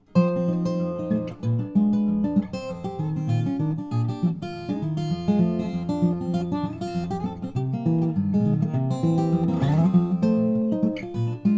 1928 Dobro; Gene Bush

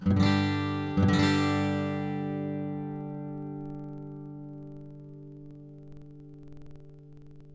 1946 Martin 00-18, guitars

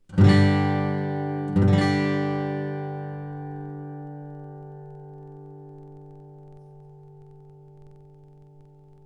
Super Cocobolo, guitar

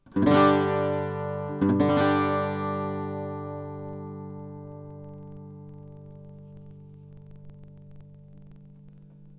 1934 Gibson L-00

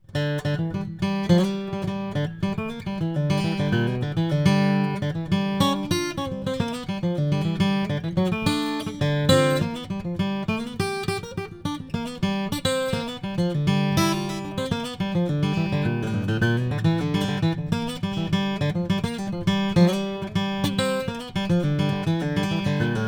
Herringbone Brazilian, guitars; Chris Bozung

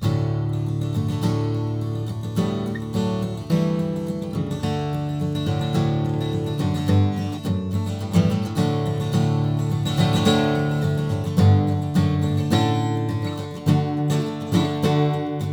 Model J Cocobolo DADGAD; Jim Wood

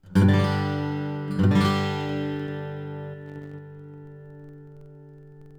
Model J Brazilian

